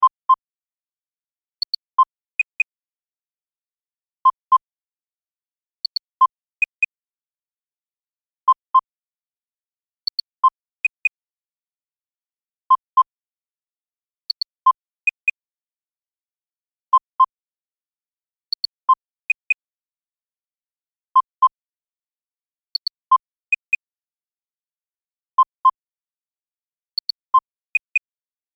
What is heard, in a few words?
Science Fiction Control Room Beeps; Control Panels